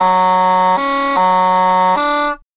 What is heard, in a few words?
keys fluttertonguing, jazz loops